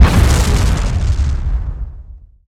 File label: Explosions Medium Explosion